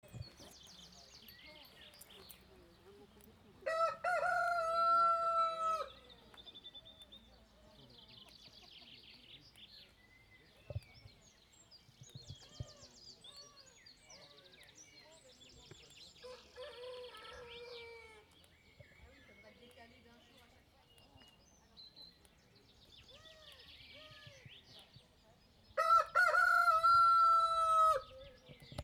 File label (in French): cocorico